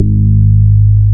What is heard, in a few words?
BAS MKS-50, Roland